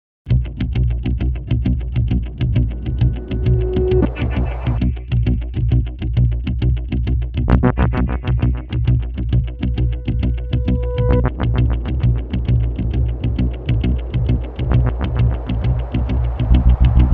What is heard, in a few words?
80s Synth Groover; rock indie pop